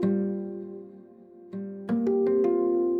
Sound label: ethno, 160, C